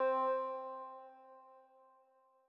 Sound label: key; bespoke - Floral v.1